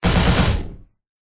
science fiction blaster gun automatic shoot fire rounds; zapsplat